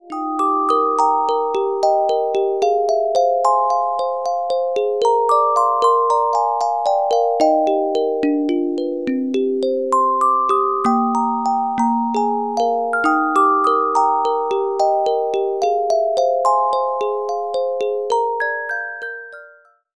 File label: Carillon Music Box Melodies